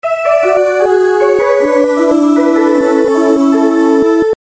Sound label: Heavenly Nokia Tune